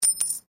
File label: Coin Penny Single Drop Stone